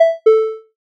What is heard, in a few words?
connection lost